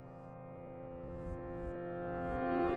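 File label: piano Room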